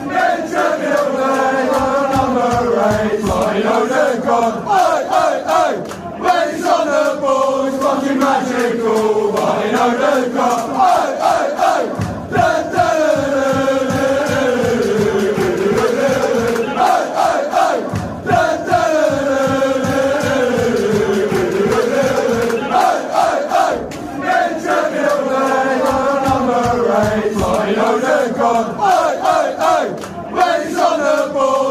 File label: player chant, fan chants; martin odegaard arsenal; united kingdom